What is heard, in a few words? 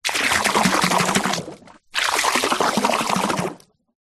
household water spray hose jet into swimming pool short burst close up surface; zapsplat